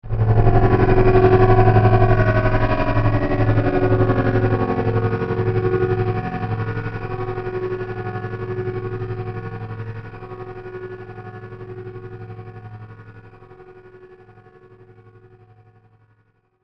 Chopper Flange, Production, Element, Chopper, Slight, Flange; BlastWave